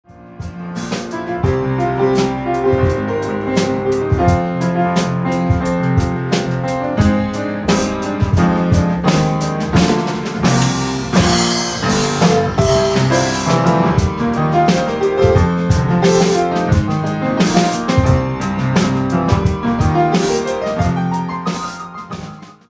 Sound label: Rock, Jazzcombo